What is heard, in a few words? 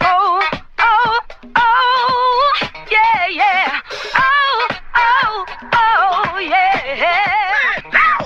voice get funky oh oh oh; Loops; Phonky